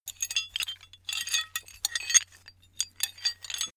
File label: metal handling pieces sequence; atmosphaeren umwelt; baustelle